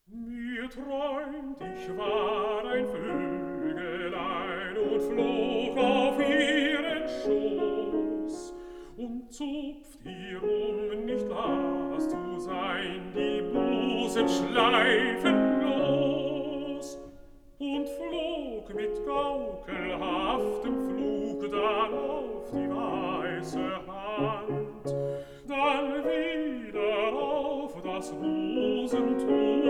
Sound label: song for voice & piano